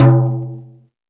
Jazz Drums, E-MU Pro-Cussion; drum machine

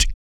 BOOGA BRETH, Vocal Planet Blues & Soul; ILIO

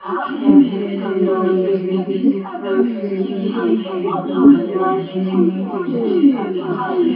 Vox FX

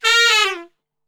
A#2 SAX SHF, Sax; Giga Samples Collection

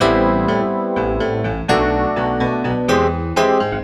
E PIANO 125, Keys; Houseworx

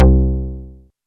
PAD, OLD SYNTHS; Rubber Chicken Software Sample Bank

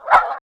ROAR, Perc Toys; Spectrasonics; Supreme Beats; World Dance

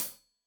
Hat Tight, Hi-Hat, Cymbals; Deeply Sampled Drums

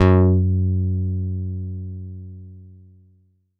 Fatboy Relaxed f#3, Korg Poly-800

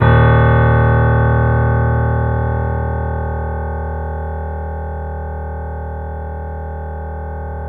D#, Piano; InVision Interactive; The Piano

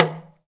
TALK DRM, Heart of Africa; Spectrasonics

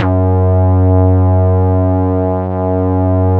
PL MOOG; Wizoo - Powered Nord; STEREO LAYER

